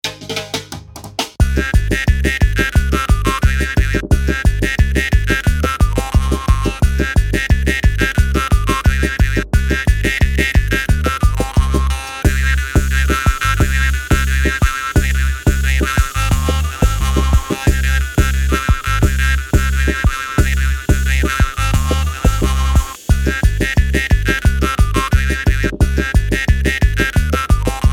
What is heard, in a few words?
Drimba n Bass